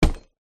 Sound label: cardboard box tall empty set down garage floor; foley